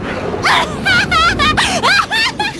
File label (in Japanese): 笑い声; レナ